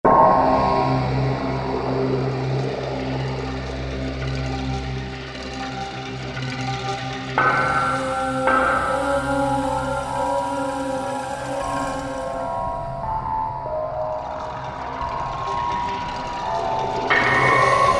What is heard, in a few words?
Inferno, Yamaha TG77; Factory Presets